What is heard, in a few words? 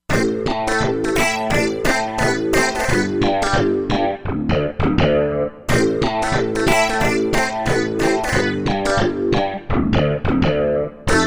Plucky Synt, Korg MSC-07S Synth; Korg MSC-07S Synth 2